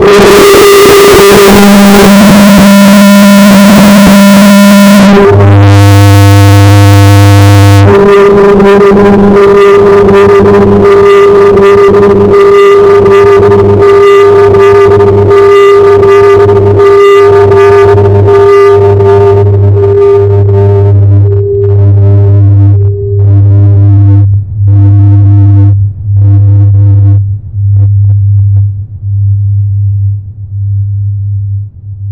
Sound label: noise